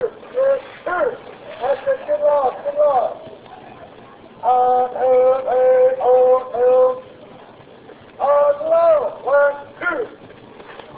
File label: march